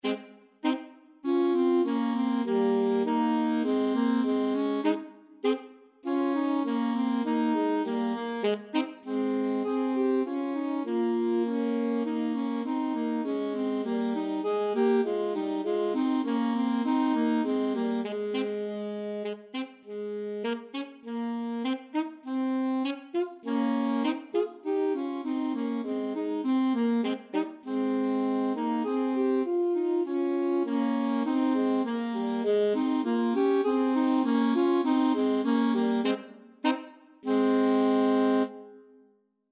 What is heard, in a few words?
Duets; 10 Progressive Graded Duets